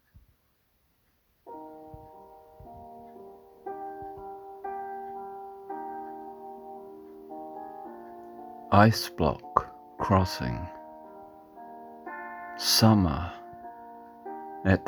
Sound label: ice block crossing